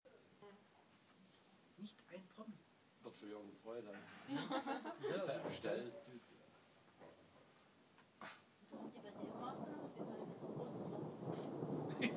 rolling thunder

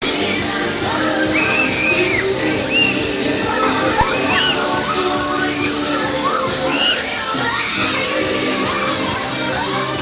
scarborough fair noises

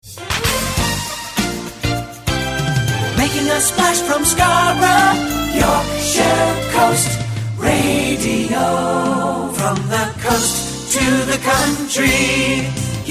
launch jingles

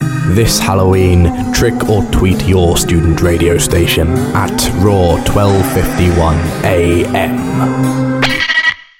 Hallowe'en jingle; RAW 1251AM

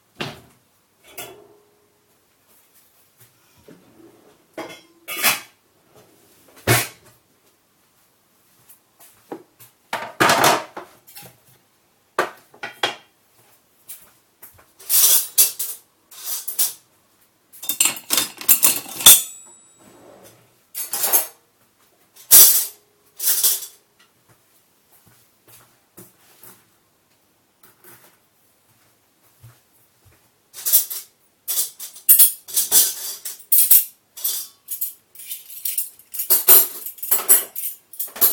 washing up soundscape

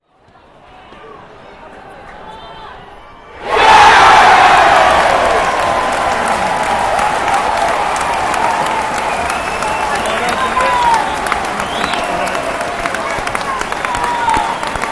craven cottage crowd

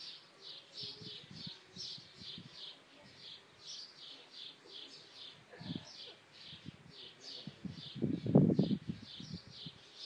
birdsong

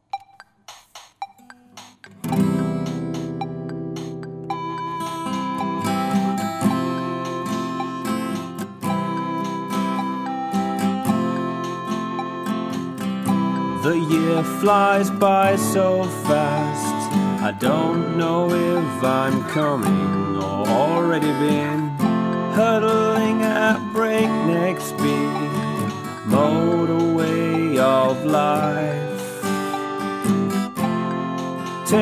song for guitar and casio vl tone

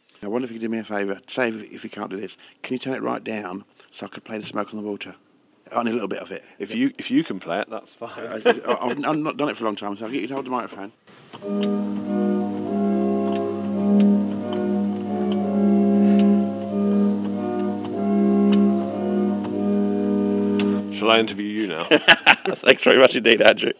on Peterborough Cathedral organ

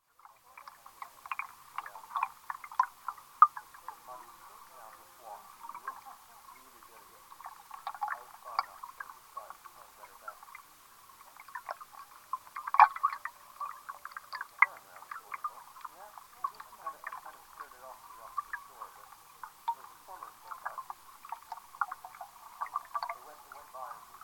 hydrophone in park canal

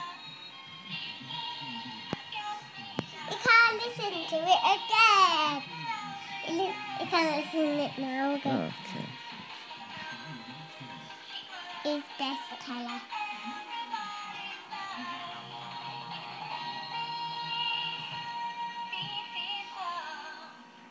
eurggghhh

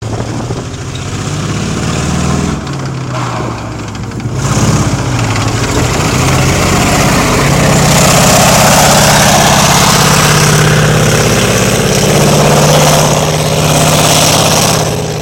25pr sexton self propelled gun

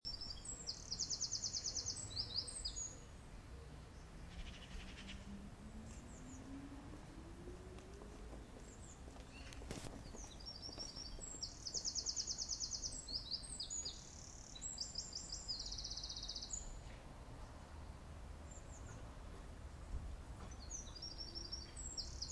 tweet tweety

